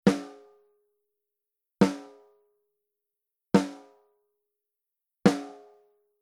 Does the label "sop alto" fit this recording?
No